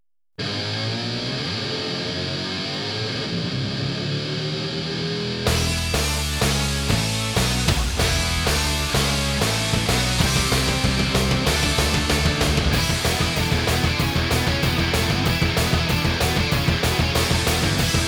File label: Instrumental Theme; Power Rangers Turbo; Fox Kids Countdown